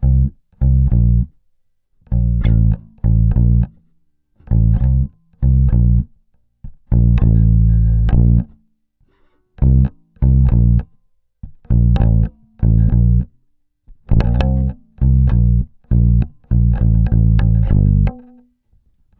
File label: COOL RK 100, Bass Grooves; USB Soundscan vol.30; AKAI